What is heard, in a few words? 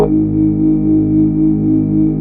SYN ANA3; Best Service Gigapack 1; KraftActiv